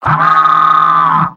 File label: pyro battlecry; vo; tf2; mvm